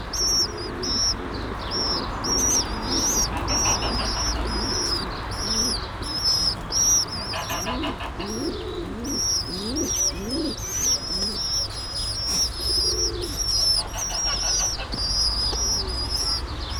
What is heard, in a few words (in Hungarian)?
fehér házigalamb fiókák közúti forgalom; kecskemeti zoo 2016